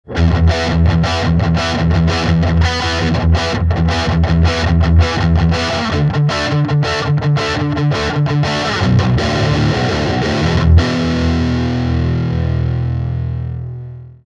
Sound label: les paul voicing; voicing aux médiums très creusés; fender super champ x